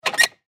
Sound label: Go Kart Brake Release; Go-Carts; Vehicles; Sports